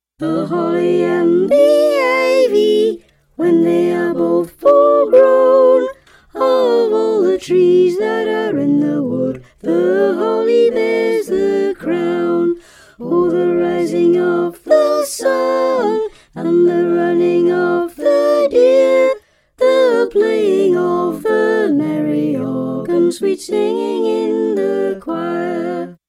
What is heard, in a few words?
The Holly And The Ivy - Soprano; Choir Harmonix; Harmonies; Carols